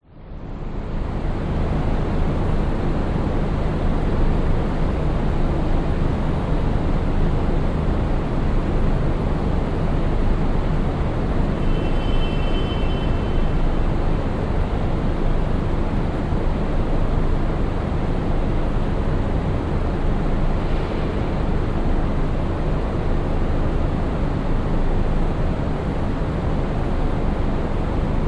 public places cathedral internal amb fans traffic outside brisbane; cathedral of st stephen